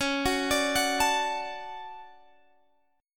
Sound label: D Major C sharp, guitar